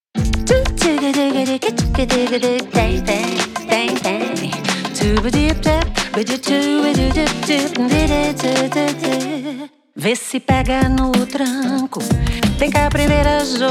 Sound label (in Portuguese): SAMBA